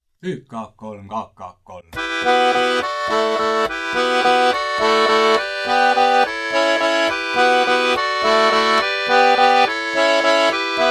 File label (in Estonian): akordion folk; Lill tudu; Mart Masurin